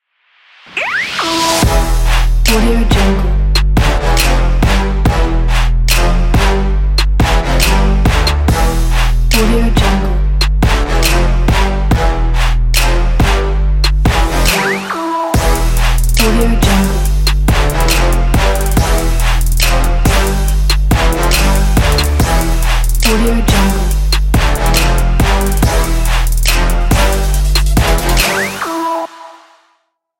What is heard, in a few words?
trap opener ident